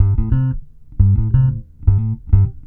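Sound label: reggae bass C; Best Service Advanced Media Trax Modern Composer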